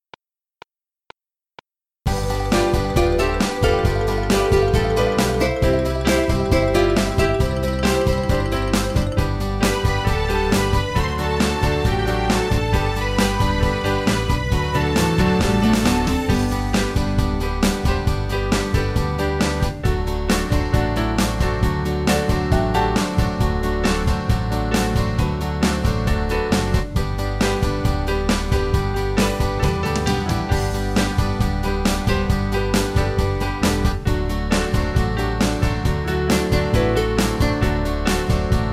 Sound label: G, backing tracks irish country pop